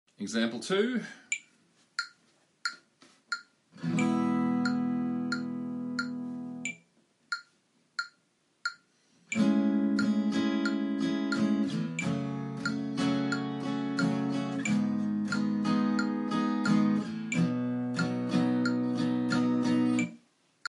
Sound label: Chords